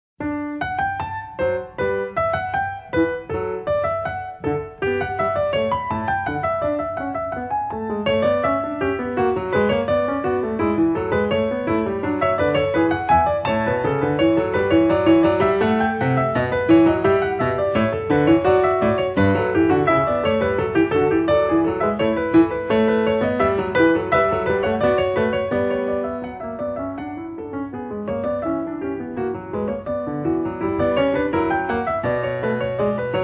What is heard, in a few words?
Three-Part Invention (Sinfonia) in D Major; Bach; No. 3; BWV 789